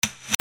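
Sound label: percussion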